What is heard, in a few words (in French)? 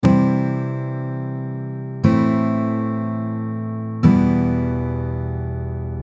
Sans capo, guitare